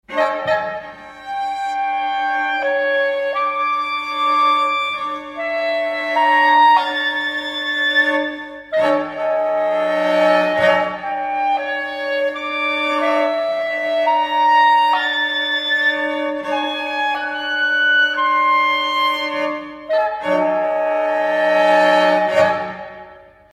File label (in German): Dreiklang